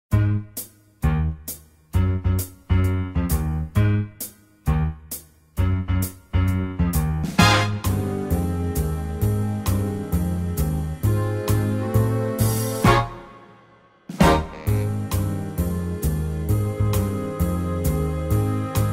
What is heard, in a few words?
karaoke